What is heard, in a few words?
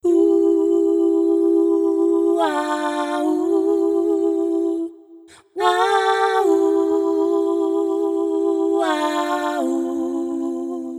Fem Choir, женский вокал